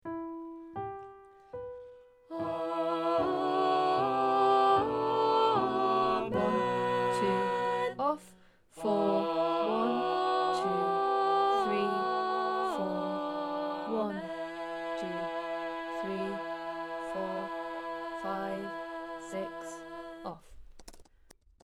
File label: amen